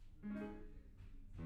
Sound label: GTR Mic